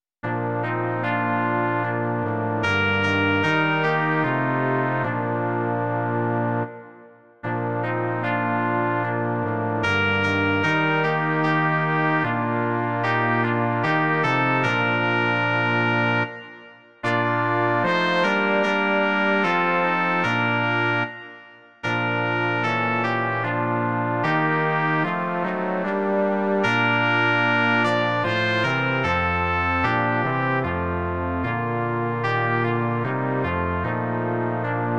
žesťový kvartet; K horám patřím já vesele; Imaginativní verze

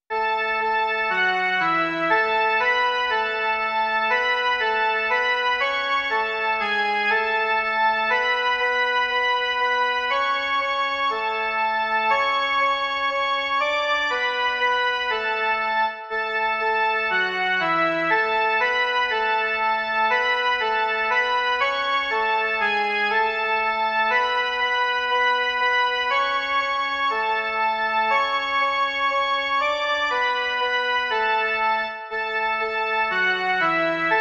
jen melodie

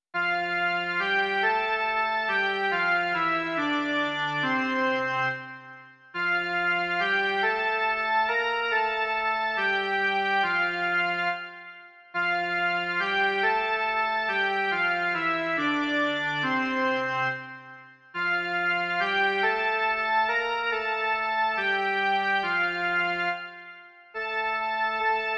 jen melodie; Sláva buď tobě, Bože náš

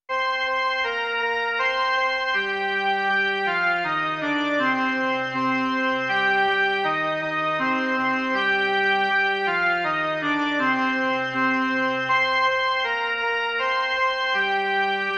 jen melodie; Dobrý Spasiteli náš

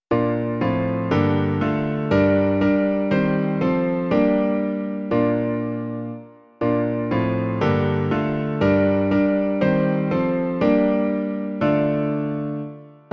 klavír; Klavírní verze

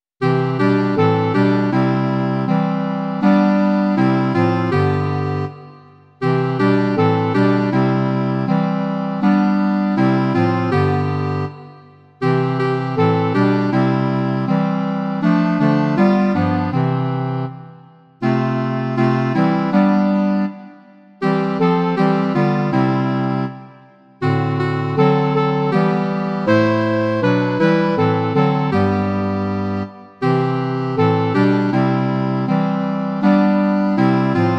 klarinetové kvarteto; Důvěřuj se v Pána; Imaginativní verze

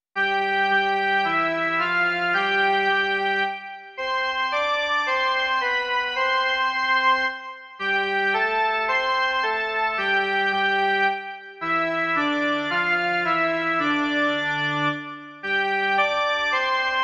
jen melodie

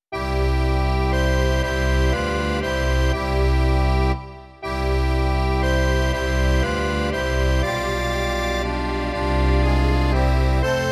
akordeon a kontrabas; Bůh, jenž láska; Imaginativní verze

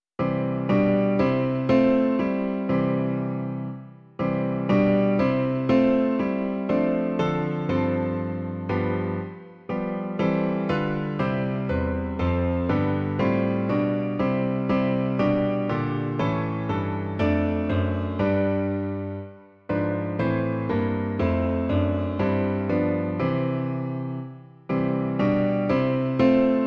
klavír; Klavírní verze

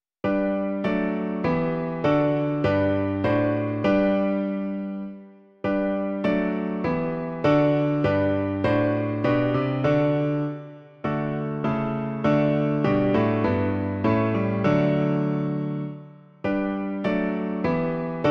Kriste Králi, Dárci spásy - klavír; Klavírní verze

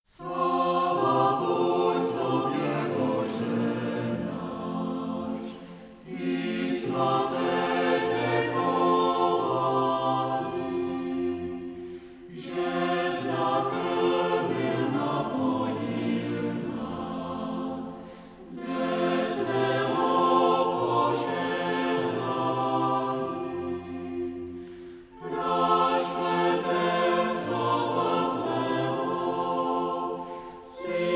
Sláva buď tobě, Bože náš, Zpěvníku; Dodatek Zpěvníku CČSH

